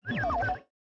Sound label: robotic inventory move; factorio 2.0.55